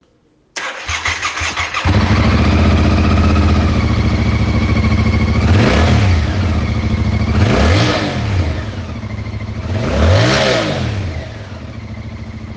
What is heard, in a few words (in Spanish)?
Sonido Tracer 7